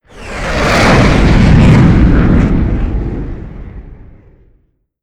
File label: mig29 flyby short